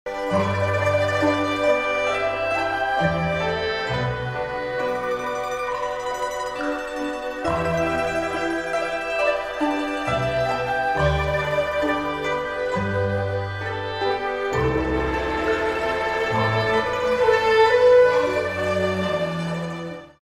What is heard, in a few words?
Japanese meditation; مدیتیشن ژاپنی